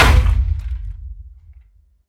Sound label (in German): build begradigen